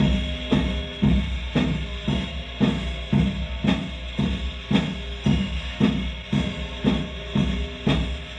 Chamberlin Rhythmate Loop, Drum Machines

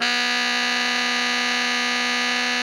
TENOR GROWL B 3, Saxophones; Greytsounds; Philip Wolfe David Crozier's Saxophones

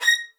B6, LOUD VIOLINS; Q Up Arts; Denny Jaeger Master Violin Library

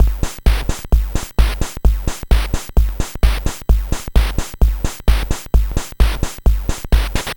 NES Drum Loop

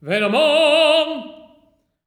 TEN. TRAD; Spectrasonics; Symphony of Voices

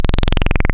Saw Hi Res, wavetables; Animoog

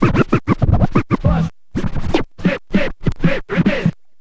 scratch short, Vinyl scratches; Masterbits; Vinyl Attack; scratches mix

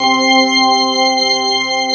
CHURCH C4; East West - Global Sounds; SD1 PADS

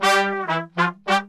BRASS SAMBA; World Grooves; Best Service ProSamples vol.14; AKAI

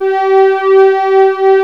POLARIS C5, YAMAHA, Analog Fantasies; USB Soundscan vol.49; AKAI

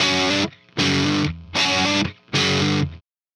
Riff Guitar Ending, Indie Rock; Sonic Foundry (Sony Creative Software); Indie Rock Anthems; Session 06 - Haze

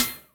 Ana Wee, Electric Snare, Yamaha DTXpress

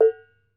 BONANG, Bronze Gamelan; E-MU Formula 4000 Series Vol. 4; Earth Tones

